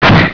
swing

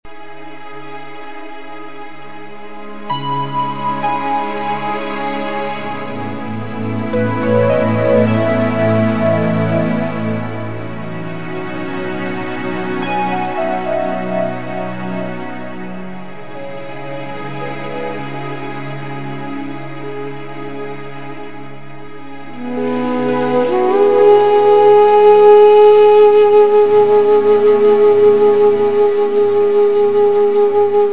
reiki harmony, healing

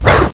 swing

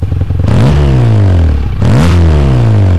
nomal, Slipon Muffler; ノーマルマフラーの音; 排気音はバイクの後方１ｍで排気が当たらない位置でMDにて録音しました。; スリップオンタイプのサイレンサー

